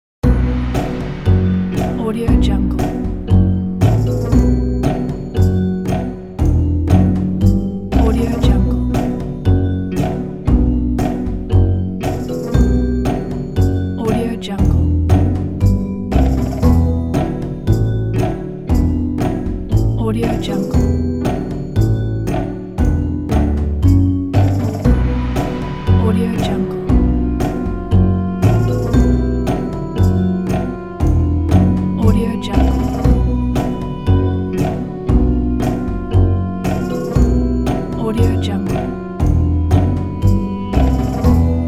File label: mafia theme